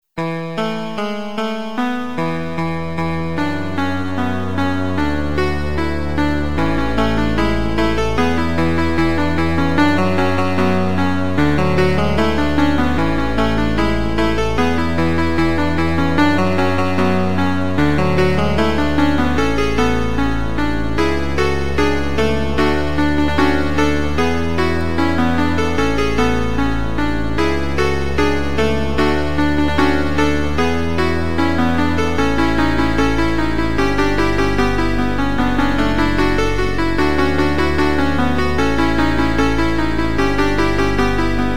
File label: High Score, Amiga; Agony; Moonchild